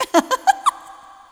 laugh

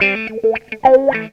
GTR, GTR RIFFS, Funky Elements; Zero-G

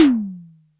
Tom2, Clavia Micro Modular

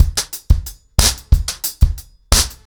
90BPM, acoustic drums, drumloops

